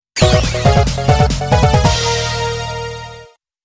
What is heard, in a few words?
Battle Victory; R8